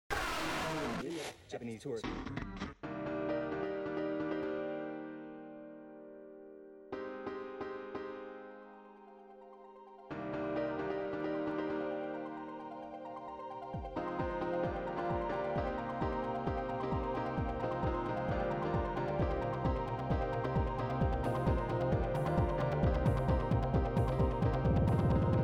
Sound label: Epic Trancer